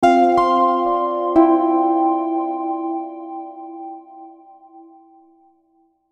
Logo String Melody, Logo Twinkle Filter Delay; Sonic Logo; Blastwave

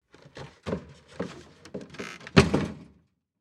Patio Screen Door, Screen Door Close; Household; Sound Effects:Doors

